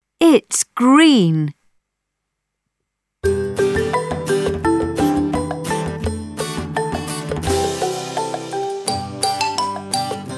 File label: its green chant